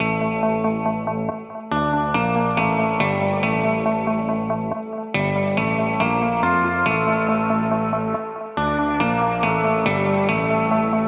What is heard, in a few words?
Melody Loop - 140 BPM D Min, Hip Hop; Humble Genetic Melody Loop; Cymatics - Humble Hip Hop Sample Pack